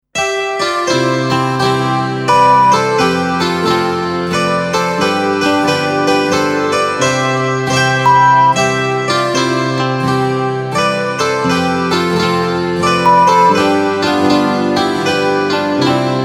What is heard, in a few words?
Zither engl Walzer 82 3 4; Weihnachten; Die Hörprobe enthält ein Wasserzeichen (Störtöne).; Dauer: 39 Sek.; Tempo: langsam (82 bpm); Rhythmus: 3/4-Takt; Besinnliche Zithermusik; Volksmusik, alpenländische, bayerische Musik, Zillertal-Sound, Oberkrainer, Zither